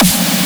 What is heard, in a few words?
House Snare - Big Room - F#; Drums; One Shots; Snares